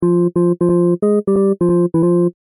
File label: Death (Halloween); android; mobile; gamerip; syobon action halloween edition